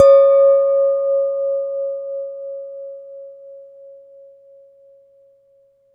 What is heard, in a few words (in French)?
verre grand; 96khz 24b mono; In the kitchen